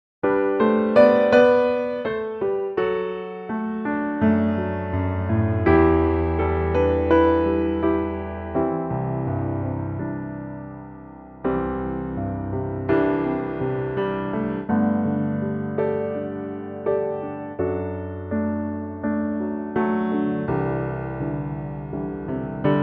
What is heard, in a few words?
piano G A; G to A Pianotrak; Gorgeous piano only arrangement; in a more manageable male key